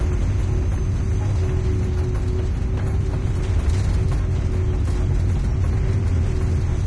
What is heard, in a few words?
stalker train interior loop; ambient; source engine; episodic